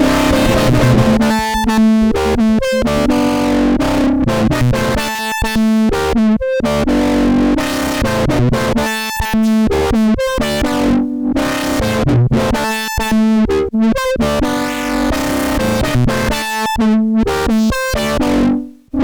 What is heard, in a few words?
plaster, noise loops; Loops recorded mostly with a loop pedal, others made in audacity and software of that ilk